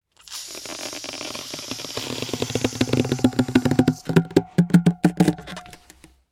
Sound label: Foley Balloon Blow Up Long